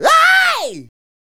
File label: Male Scream; Sonic Foundry (Sony Creative Software); Vocal Toolbox